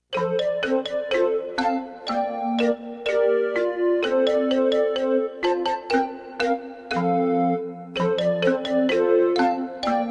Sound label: music only; childrens songbook; Chinese